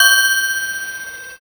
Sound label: handbell